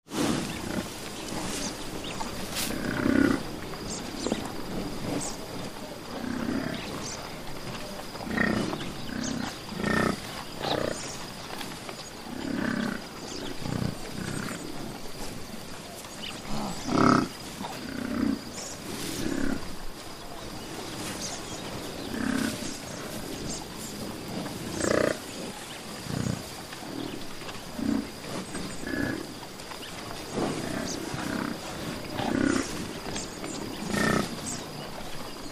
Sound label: Buffalo Grunts, Snorts. Group Grazing With Grunts